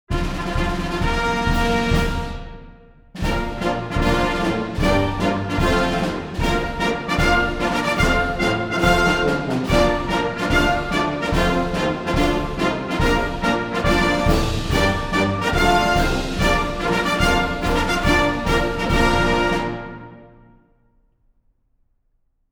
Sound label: ONE RUFFLE AND FLOURISH AND GENERAL'S MARCH